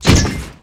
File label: Grenade Launcher Shot; SFX Kit; Weapons